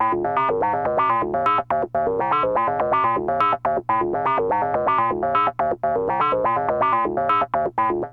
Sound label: RAVE 123, SYNTH SEQ; AMG; Global Trance Mission VOL-2